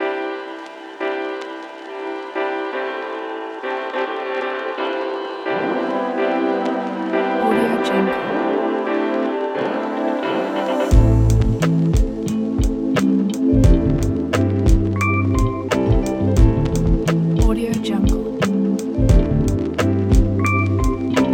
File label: trippy lofi hiphop